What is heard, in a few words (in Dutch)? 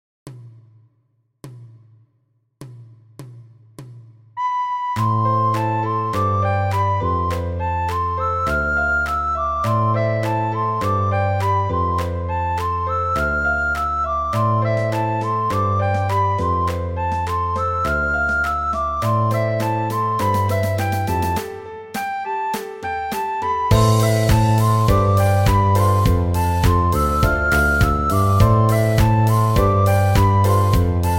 sopraan; Flierefluiter 5